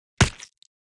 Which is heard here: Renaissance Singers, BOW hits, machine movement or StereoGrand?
BOW hits